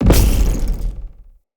Staff Caster Cast Short Shot, Weapons; Player; Staves; Galene

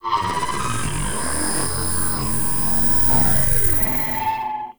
Resonant Energy; Ambience; Warframe; Corpus Railjack

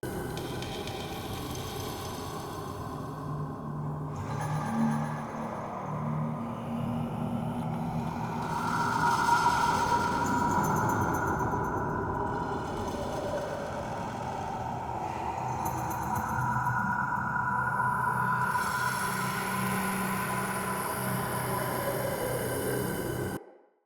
Crypt Bed Large; Ambience; Champion Crypt

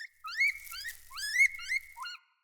Hare Idle Chatter; Encounters; Animal Rescue

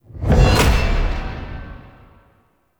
Deselect; UI; Hell Floor; Warframe; Tau Mission Menu

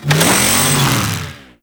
Saw Melee Swing Long, Weapons Melee; Warframe; Grn Ghoul Saw Player

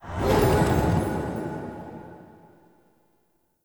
Go Board Quit; Warframe; Duviri; Komi

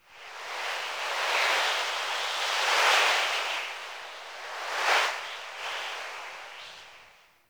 Wind Gust; Warframe; Eidolon Plains; Ambience

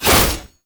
Melee Whoosh; Warframe; Oraxia; Abilities

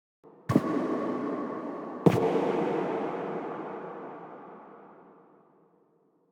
Steps Operator Close, Foley; Warframe; Cinematics; Apostasy

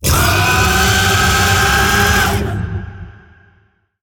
Vocal Attack; Warframe; Zariman; Void Angel

